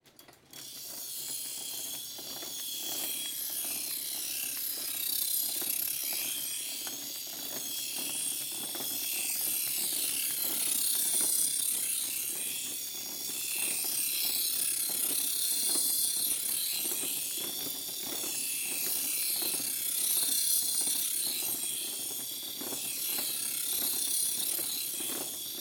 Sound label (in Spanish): AFILADOR DE CUCHILLOS AFILANDO